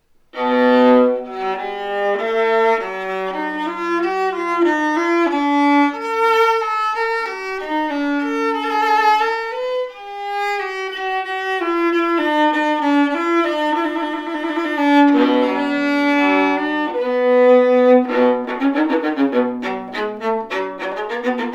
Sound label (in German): ohne Namen Bratsche